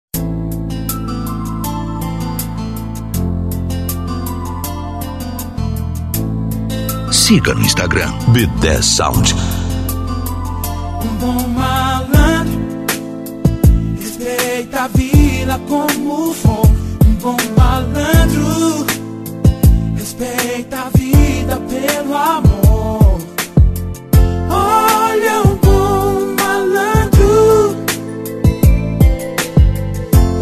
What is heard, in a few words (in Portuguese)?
rap nacional